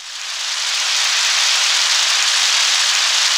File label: RAIN; Back In Time Records - Big Bang